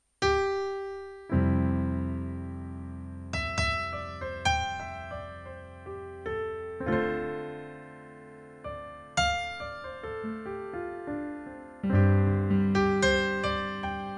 F 20 Piano

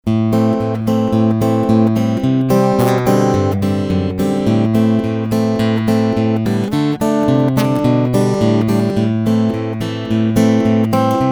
Finger Picking

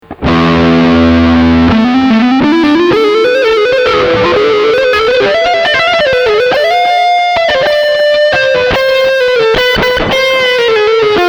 Lead Delay

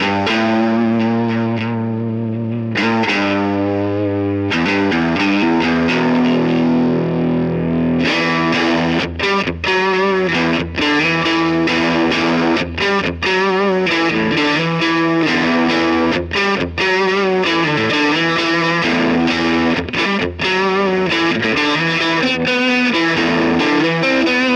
Nux MG-30 Vox AC30

